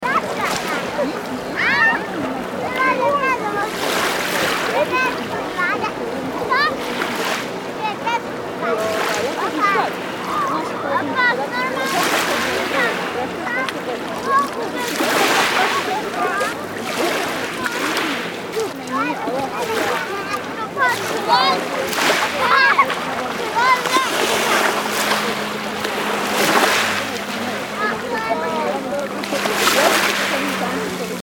Summer sounds kids on the beach

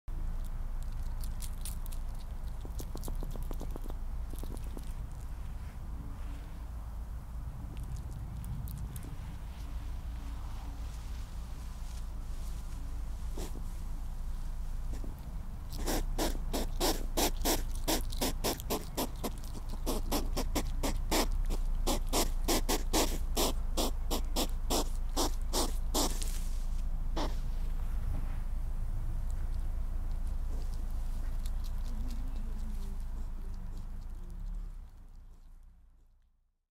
hedgehog sounds

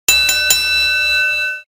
wrestling

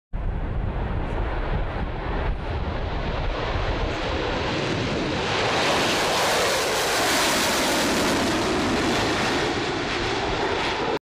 Concorde; هواپیما کنکورد

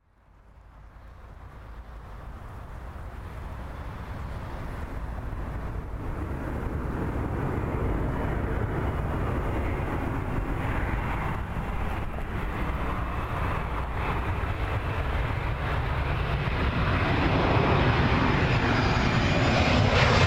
vehicle aircraft airplane passenger landing

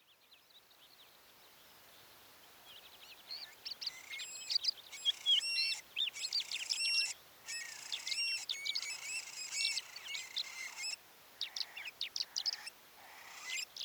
Eurasian stone curlew

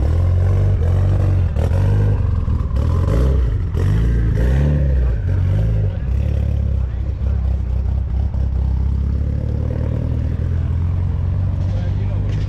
mitsubishi

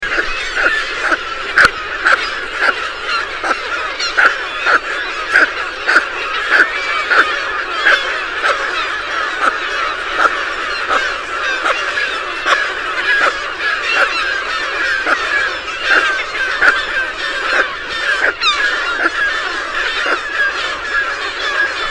pelican sound

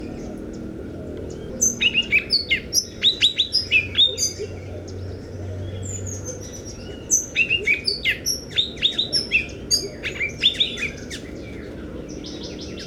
Buff throated saltator

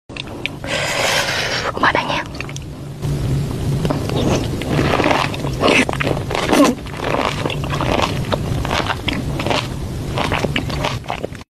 Eating lemon

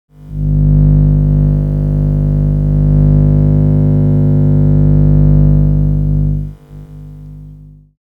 electro magnetic; مغناطیسی

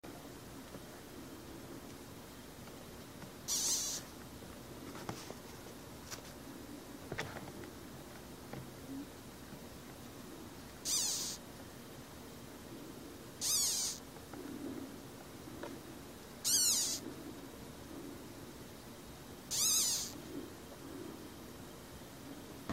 butterfly larvae; لارو پروانه

